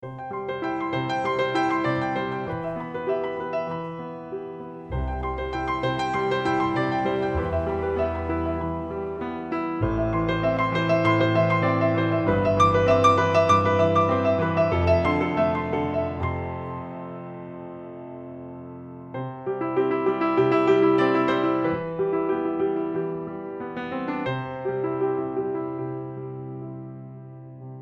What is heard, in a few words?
soothing mobile ringtone